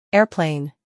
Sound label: airplane